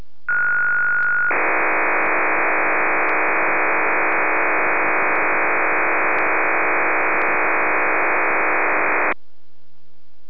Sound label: digivoice